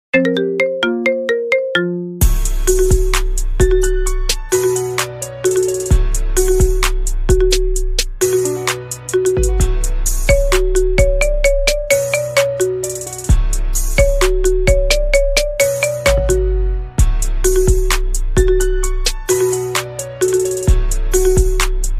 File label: iphone remix ringtone